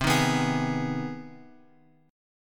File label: C Minor Major 7th Sharp 5th, C; CmM7#5 Chord {8 6 9 X 9 X} strummed